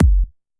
Kick

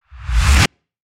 Woosh

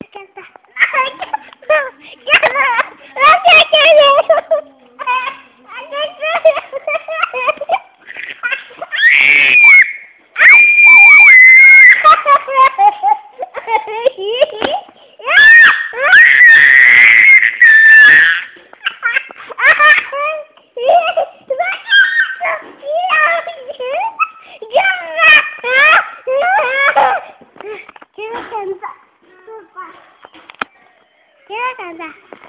Laughing

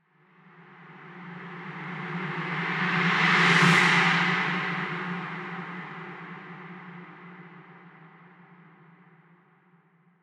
Deep Swoosh

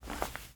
Bag

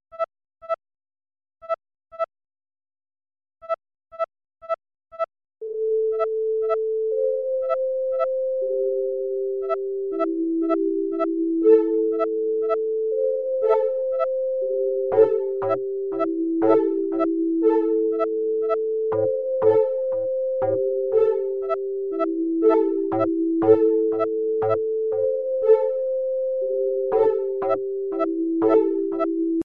Asian Walzer

